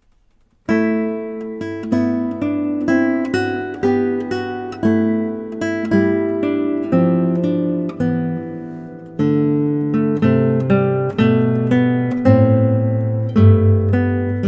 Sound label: Chant, classical guitar